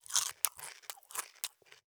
human eat

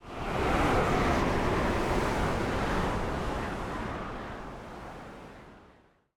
strong wind

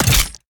earth prick

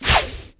vine

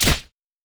circle sword

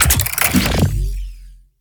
grenade 2 cast

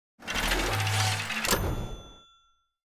win

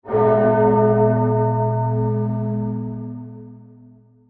church bell

